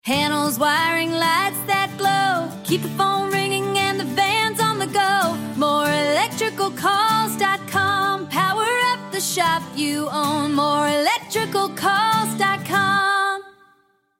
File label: jingle